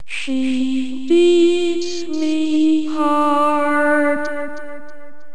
Electronic Beats

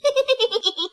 Evil Giggle; Pinkie Pie

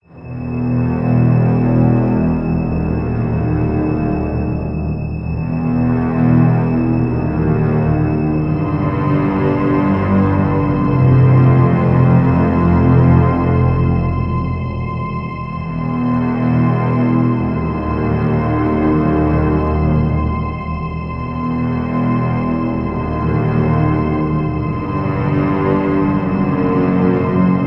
Epic Film Music Loop

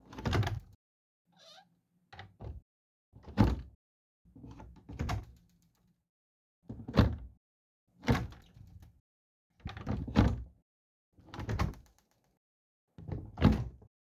Closing Closet

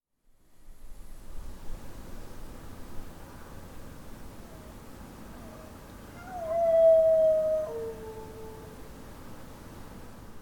Fox Mating Call

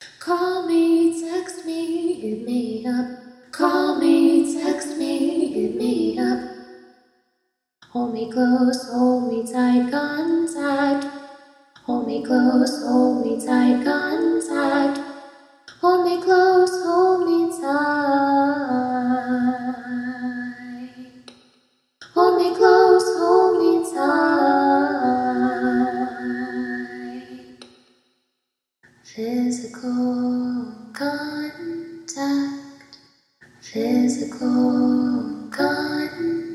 Contact Samples W Harmony

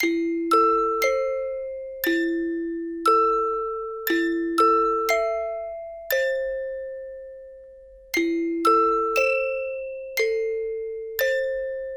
Music Box

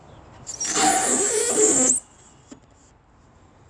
Slide Squeak

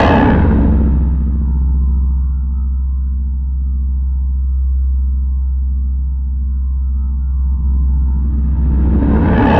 Massive Drop Rise

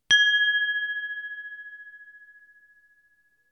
Small wine glass Loud

